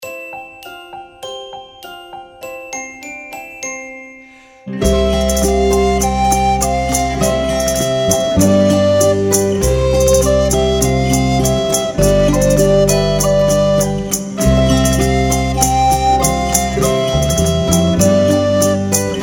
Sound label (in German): Gavotte In C, Altblockfloete; Schule fuer Altblockfloete 1